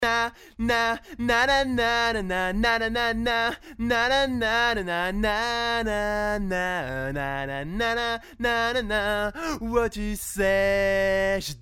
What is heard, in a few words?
voice woof